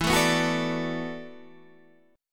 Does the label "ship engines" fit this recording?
No